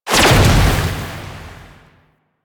Crushing Water Release; Warframe; Yareli

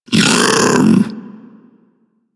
Infested Splitter Split Top Vocal Attack Medium; Warframe; Grey Strain

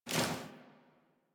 Generic Weapon Aim Transition Out; Warframe; Weapons

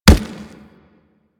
Run Dirt, Footsteps; Warframe; Kubrow

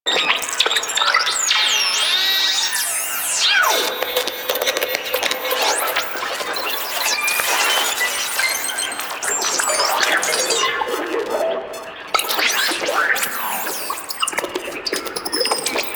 Tentacle Proj Loop; Warframe; Proto Infested Ancient; Abilities

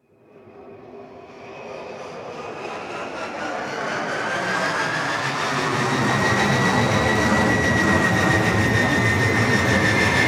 Ship; Eidolon Plains; Cetus Intro; Cinematic SFX

